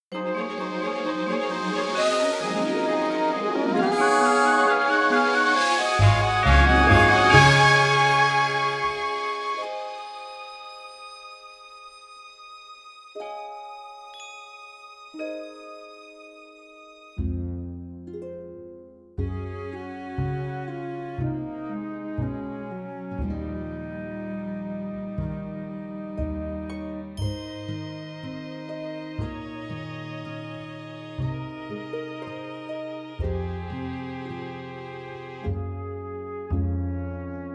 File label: Shrek instrumental tracks reprise; trixtrax